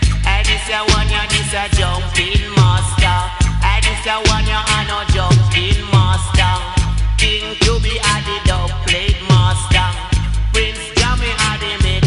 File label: music; crimecity; jumping master